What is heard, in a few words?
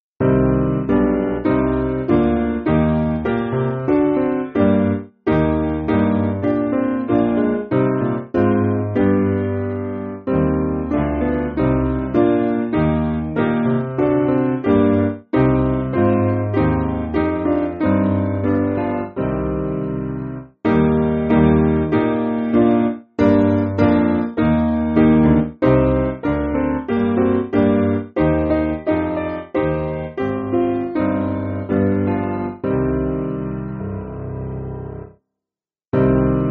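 Piano, Backing Files for Churches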